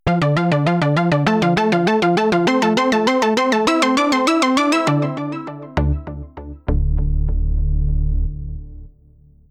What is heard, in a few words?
Synth Sahara